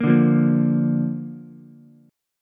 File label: D Minor Sharp 5th D down Ukulele; Dm#5 strummed; JGuitar